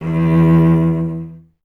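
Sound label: STR VC E 2, strings cello; Miroslav Vitous; Solo Instruments VOL-1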